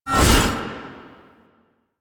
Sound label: Whip Swipe; Weapons; Melee; Warframe; Dagath